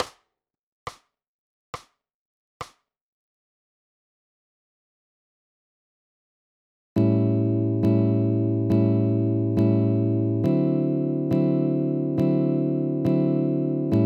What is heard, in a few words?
Blues Exercises A Slow Blues in A7